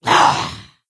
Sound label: Attack; Tenebron